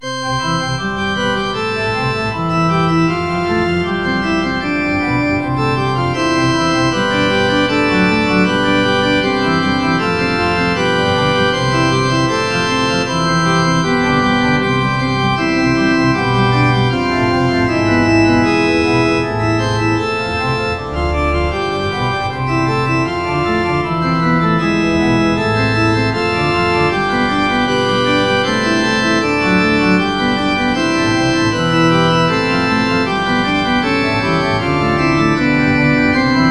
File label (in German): vom himmel hoch da komm ich her orgel